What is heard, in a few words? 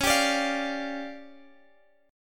D Minor Major 7th Double Flat 5th, Db